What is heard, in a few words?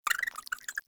Rat Pet Squeak; Warframe; Ambience; Nineteen Ninety Nine